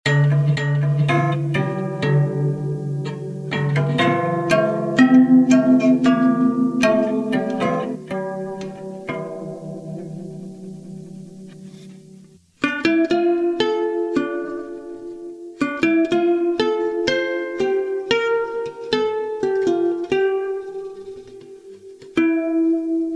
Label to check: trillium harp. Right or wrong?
Right